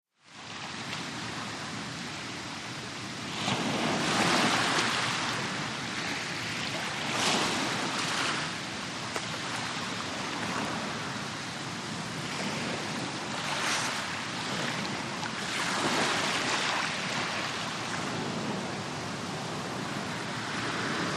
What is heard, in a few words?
Lht Surf Close Up, waves & surf